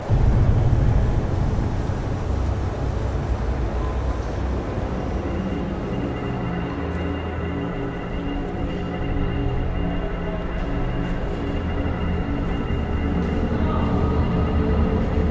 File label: Fx Space station innen loop; darkstar one; windows xbox 360